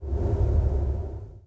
WIND, wind

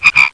GOOD FROG

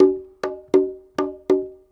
PERCLOOP ETHNO 125; eJay

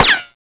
metal, srifle; SINDEMO; weapons

